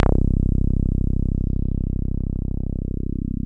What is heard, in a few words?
TB303 ACID; JV-1080